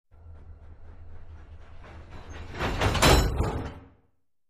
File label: Train Freight Train, freight trains